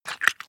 Sex Noises squirt; Hilzartov; Fairy Size Doesn't Matter

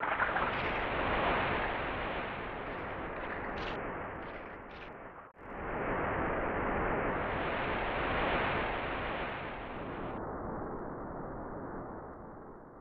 Ocean Ambience; snes; super gussun oyoyo 2

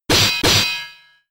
Metal Claw; attack moves; Pokemon; Gen 5; Blk Wht Blk2 Wht2

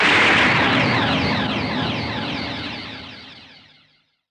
Punch

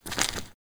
walk gravel

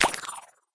Wings Bomb; Cut the Rope Time Travel

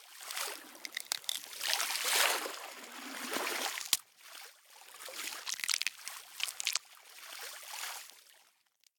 Egg Wash; The Inn; Onsen

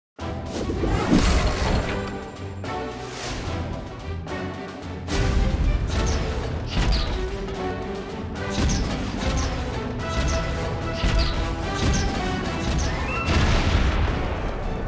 Long Cannon; Disney Infinity

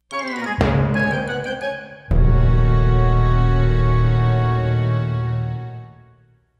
Minigame Failed; one piece unlimited world red

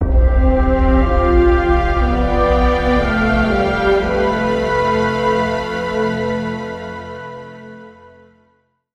Player Lose; Rise of Nations: Rise of Legends

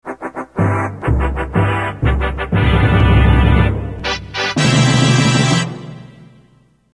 fanfare big; Strong Bad's Cool Game for Attractive People

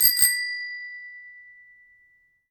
Bicycle Bell; gamerip; Idle Champions of the Forgotten Realms

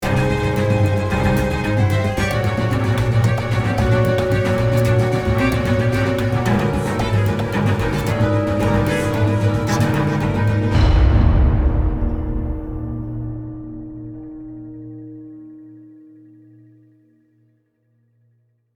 Kill (Stinger)

